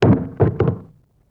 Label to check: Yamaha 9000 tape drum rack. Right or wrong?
Wrong